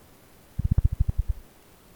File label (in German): fahrrad; Aufnahme der Beschleunigung eines Fahrrads mit der der Soundkarte